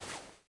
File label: Sfx Darts